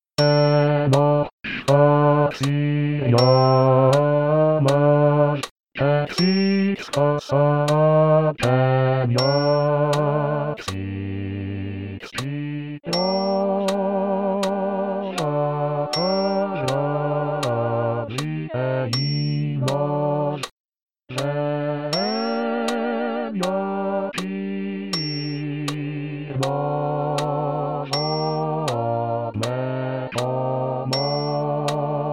Psaume 103 Voix Basse

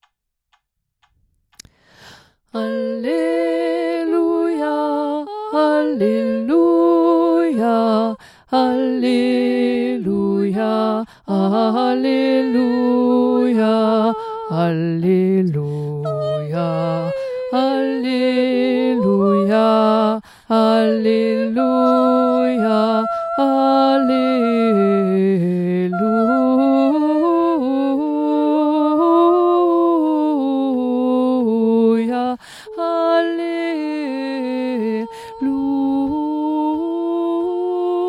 Alleluia Soprano Ténor; O Magnum Mysterium

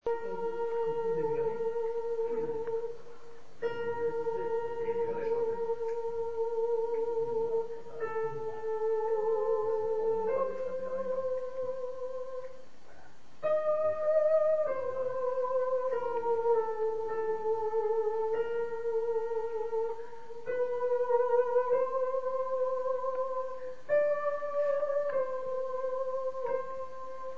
soprane

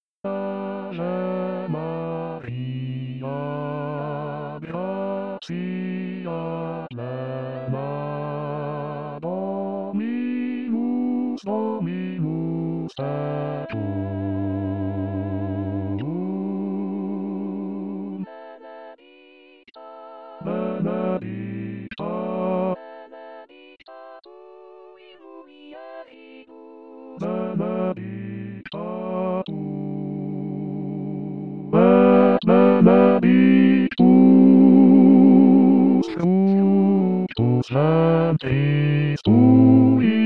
Ave Maria Voix Basse; Javier Busto

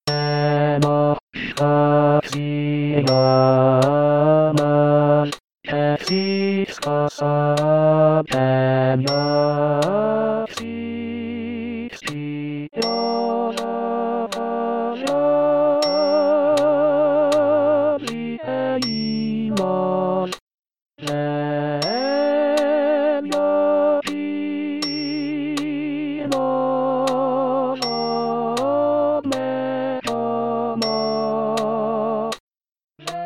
Psaume Voix Ténor

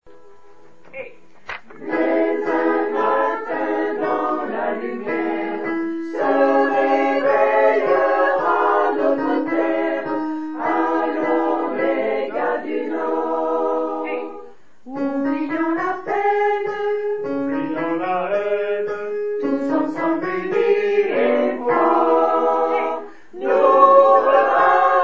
Tutti Couplet; Brume

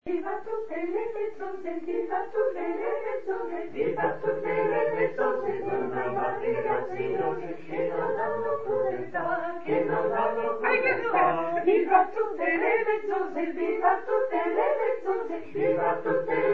Tutti Repetition rapide; Viva tutte le vezzose

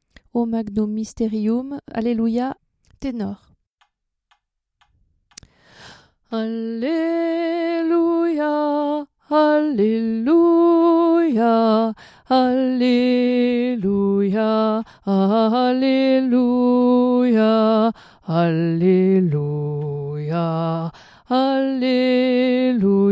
Ténor; O Magnum Mysterium Alleluia

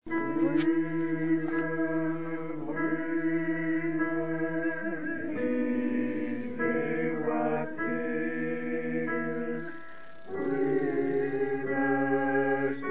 Basse; Brume

